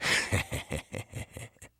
evil laughter; engineer

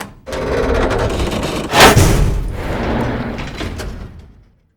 elevator open

